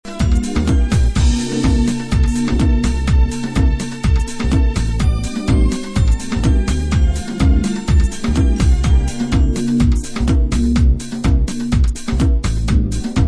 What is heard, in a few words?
roots instrumental; West End